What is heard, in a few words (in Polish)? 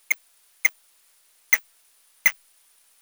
borowiec wielki, chiroptera